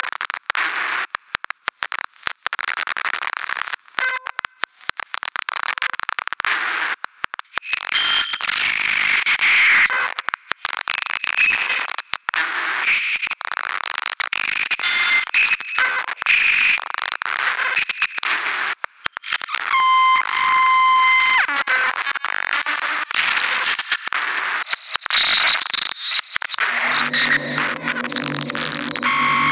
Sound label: mute loops